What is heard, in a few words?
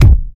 good bass drum sound c key, bass drum single shot tuned to the C note